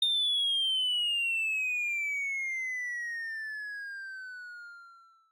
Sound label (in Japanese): rattuka; 落下; 効果音素材; 映像作品向き